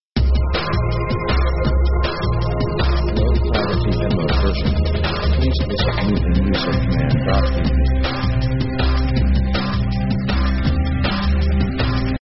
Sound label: pop electro cool; synth themes